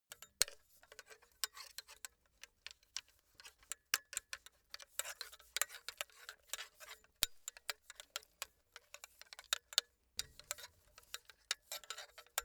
metal pipe handling long

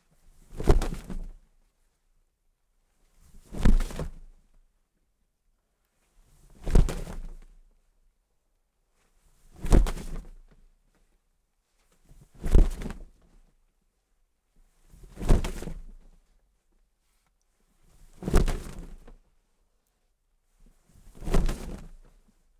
wings flap; natur-tiere; voegel

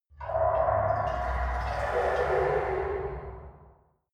drone water ripple

